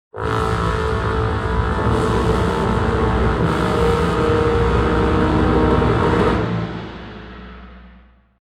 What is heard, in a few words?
MUSIC BOSS BEAT; BOSS OGRE